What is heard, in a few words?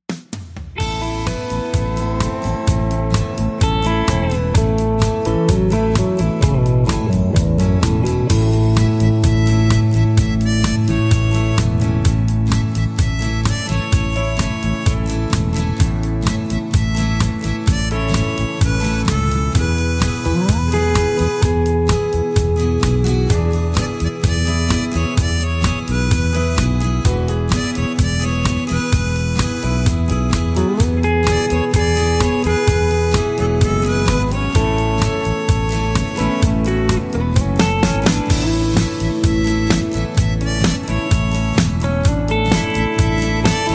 Instrumental with Leads